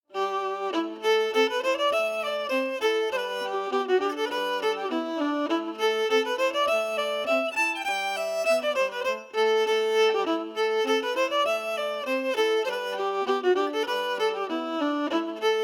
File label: melody emphasized; Loch Torridon